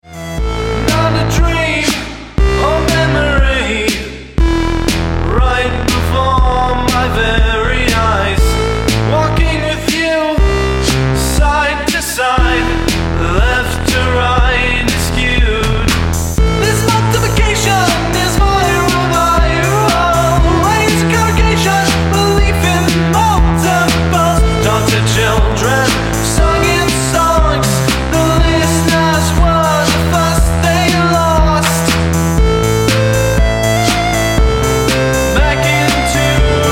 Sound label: claps